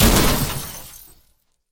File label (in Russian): bistrij hrustalnij zvon